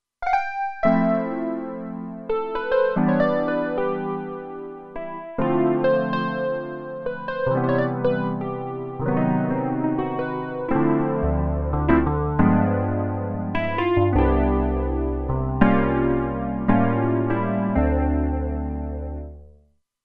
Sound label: Electric Piano, Roland Alpha Juno-2; Original Sound Chart patches